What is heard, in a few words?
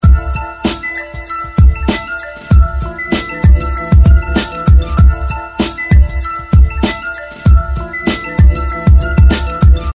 beat; techstyle